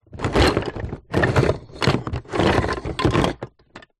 leisure toy train wooden track pieces in plastic box movement